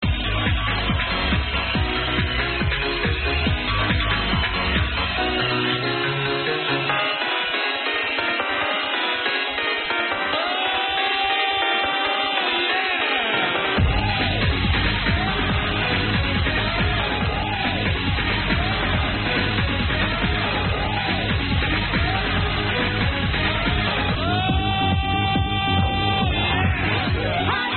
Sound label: old techno